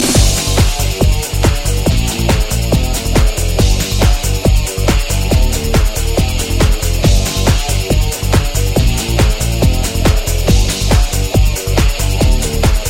Techno Ringtones

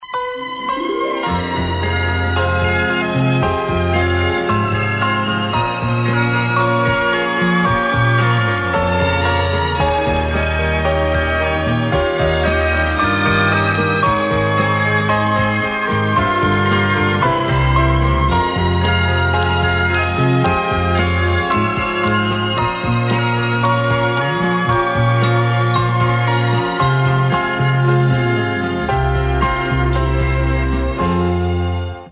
Classic Ringtone

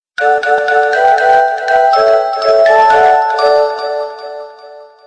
SMS Ringtones

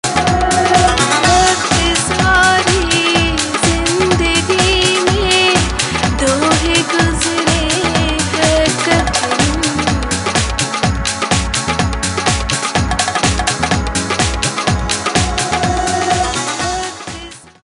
Motorola Q9 Original Ringtones

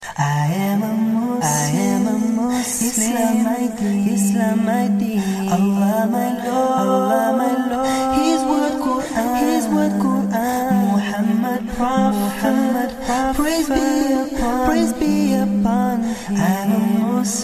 Islamic Mobile Ringtones

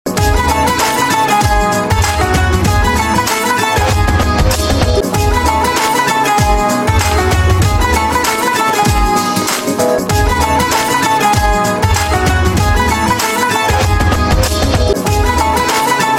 India Ringtone

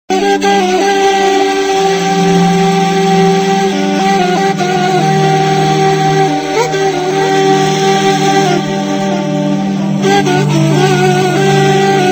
Moharam Ringtone

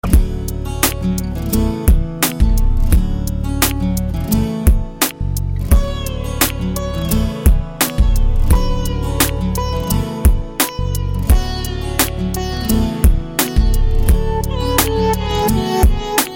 Guitar Ringtone

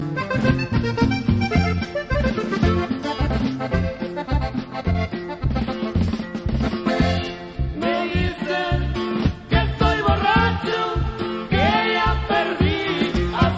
Mexico Ringtone

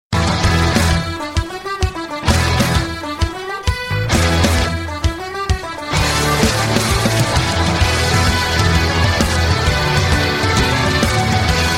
Irish Ringtone

